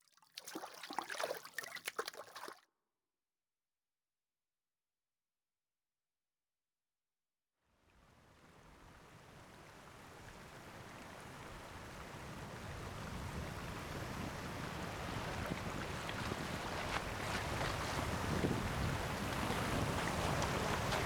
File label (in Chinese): 球磨川航行 航行河川环境声ASMR; 留声 铃城